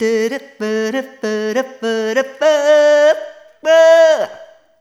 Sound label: Rhythmic A